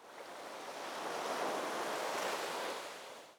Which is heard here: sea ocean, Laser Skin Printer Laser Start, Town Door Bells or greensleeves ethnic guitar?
sea ocean